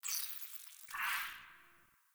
Tree Critters; Warframe; Duviri; Ambience

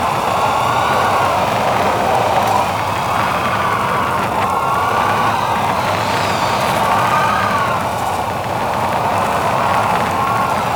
Dragon Elemental Breath Ice Loop; Warframe

